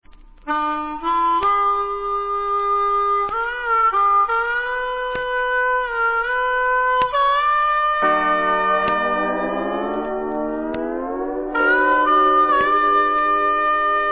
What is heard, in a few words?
Diatonic Sample, harmonica